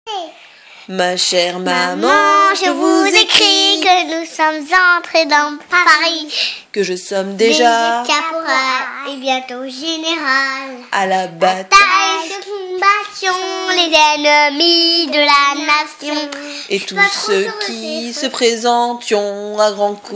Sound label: Vocal